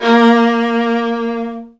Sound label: Virtuoso Strings